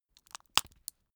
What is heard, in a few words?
plastic drug blister press out short